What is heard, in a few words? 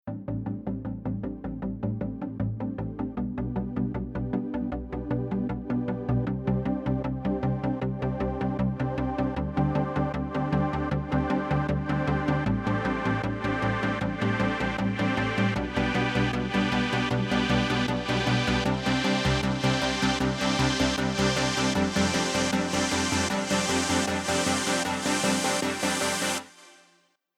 Future bass Chord Filter